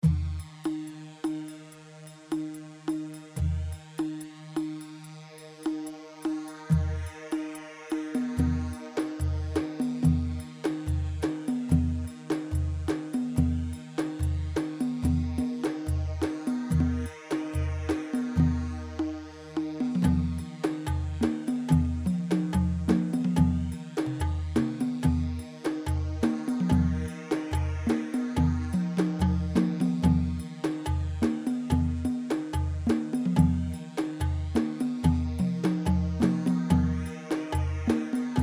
Harbi 2/4 72 Mix, khaleeji

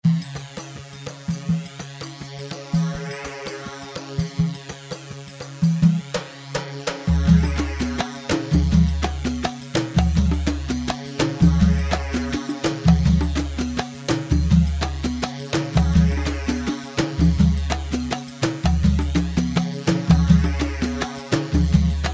Bandari 4/4 166; بندري; Cubase Session

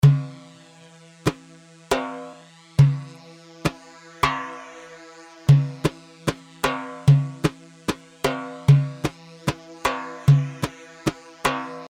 Tar; طاره

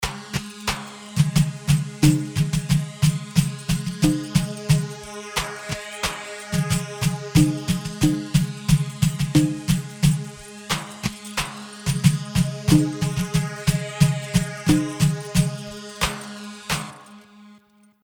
Khamari 2/4 90; خماري

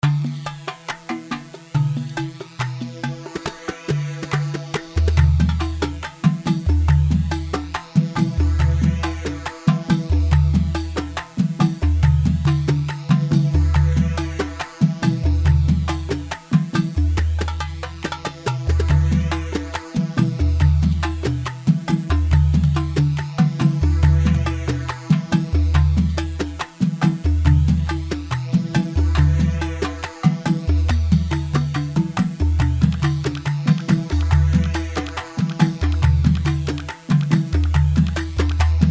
Rhumba 4 4 140; Rhumba 4/4 140 رومبا; ايقاعات; يمني; شرح يمني

